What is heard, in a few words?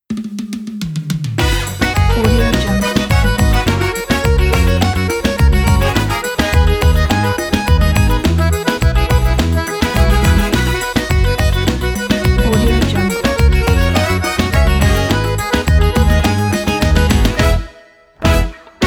forrobodo